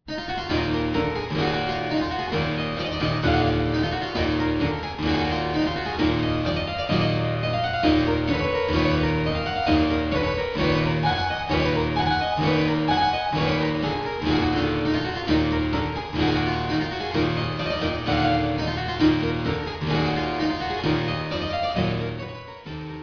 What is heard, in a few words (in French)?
tambourin